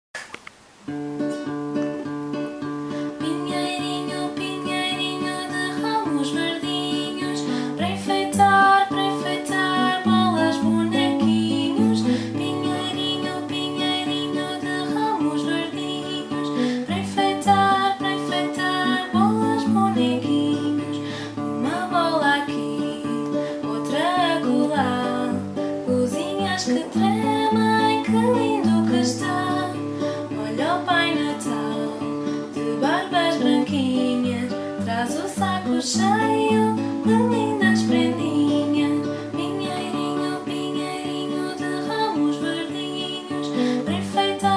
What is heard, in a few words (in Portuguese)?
Pinheirinho Voz Violao